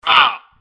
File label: hurt